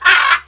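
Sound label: parrot squawk; valve; pirate bay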